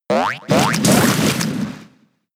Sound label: Mud Sport; Pokemon; gen 7; SUMO; USUM